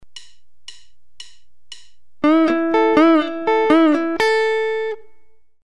3rd-string bending in a rock ’n’ roll progression, guitar